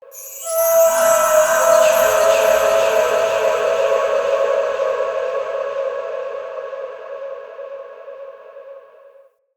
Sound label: Spirit Tree Holy Chatters